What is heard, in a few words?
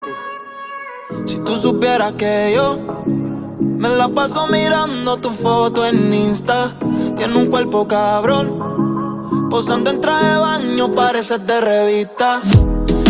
intro ringtone